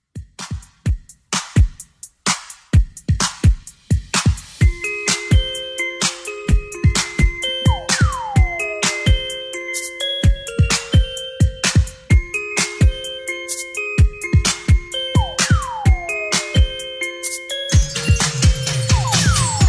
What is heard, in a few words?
80s Redrum 128bpm